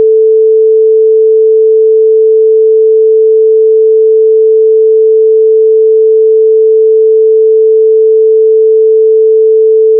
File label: tone 440 stereo